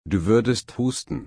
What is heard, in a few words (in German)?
husten